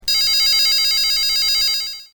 Telephone Ringing

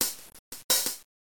hihat

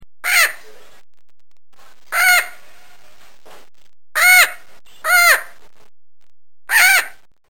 Chirp

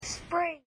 spring

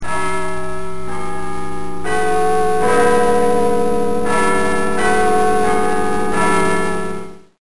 big ben

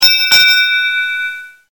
bells

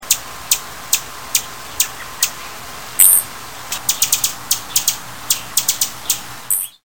rufous

